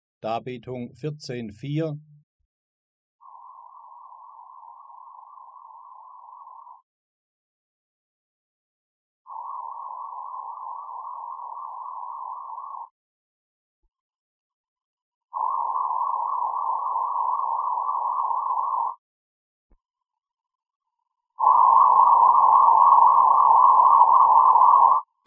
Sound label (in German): Eben wahrnehmbare Frequenzmodulation